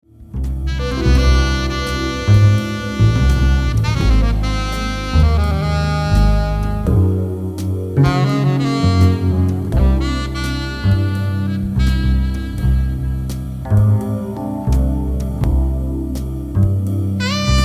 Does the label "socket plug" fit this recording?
No